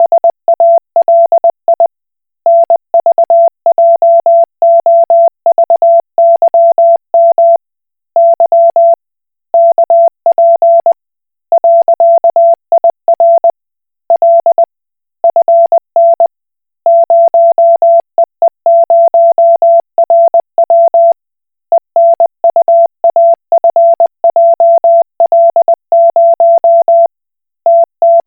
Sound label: Koch Morse